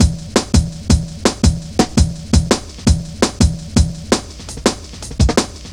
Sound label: Tha Size, Drum Loops, Jungle, 167.5